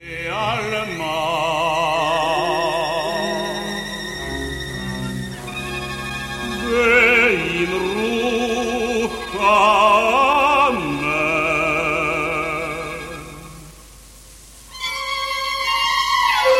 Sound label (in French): Kaddisch pour Baryton violon solo et cordes; op 54 no 1; RTBF